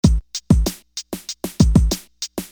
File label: Beat, Hip-Hop Street Beats